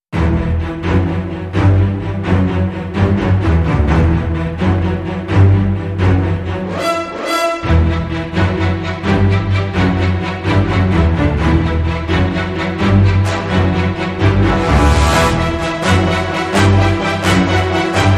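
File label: Hybrid Sting, Orchestra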